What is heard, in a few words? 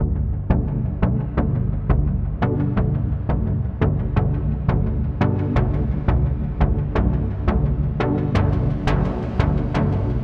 172 G progressive chords build up